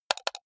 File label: computer mouse left click double hard